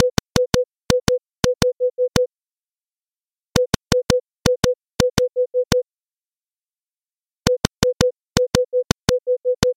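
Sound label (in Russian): простукивание мелодии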